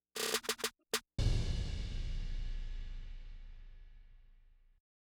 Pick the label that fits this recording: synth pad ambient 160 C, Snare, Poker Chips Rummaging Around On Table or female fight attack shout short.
Snare